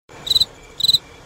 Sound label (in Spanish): cri cri grillo